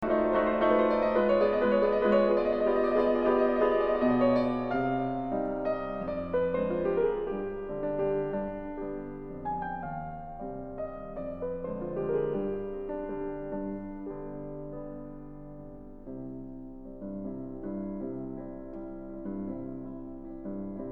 Waltzes Op. 64 No. 3 A Major

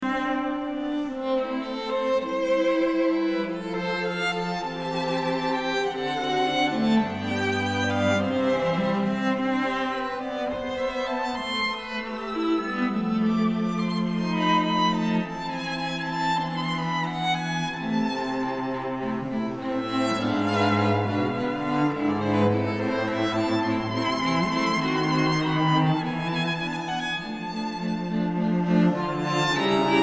String Quartet; Moniuszko; String Quartet No.1 In D Minor

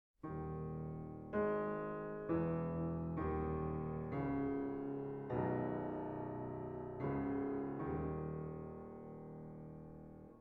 sacred piano

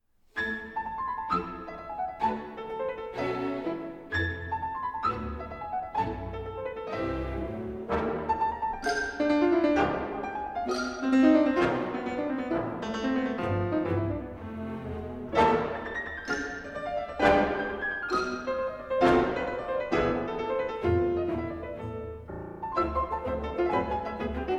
Variations for Piano and Symphony Orchestra; Witold Lutoslawski; Variations on a Theme by Paganini

